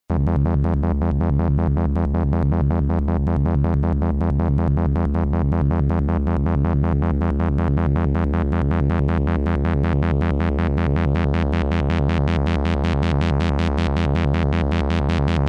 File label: polivoks polyrhythmic